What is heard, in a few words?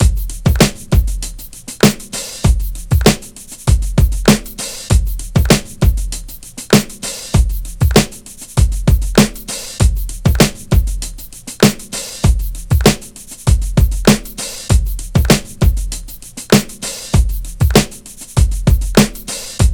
98 Heavy Library, Breaks; Lockdown Breaks